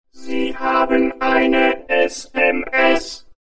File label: new sms ringtone